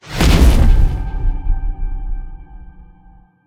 shout ethereal; Skyrim Special Edition

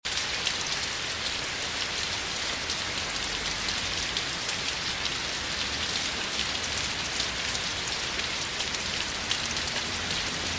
rain; Flashpoint Ultimate 13; thelastnight

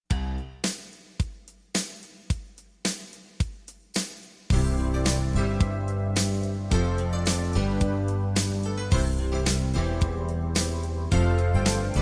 Orchestral Version; Immediate Music

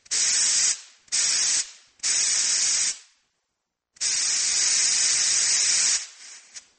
spray; shockwave; fixitupkatesadventure

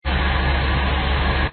truck engine working; coolmathgames

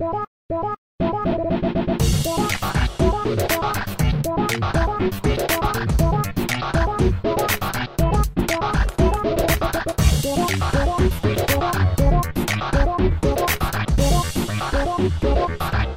snd Boss; zj the ball

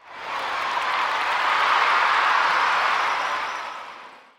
cheer; 2fast2curious

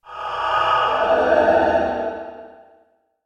ghost; pond5

